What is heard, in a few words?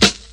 00s airy sizzle hip hop acoustic snare sound a key